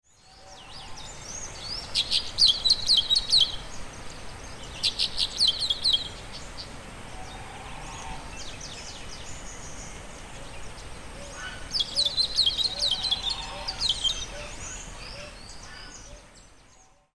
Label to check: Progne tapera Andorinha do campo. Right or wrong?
Right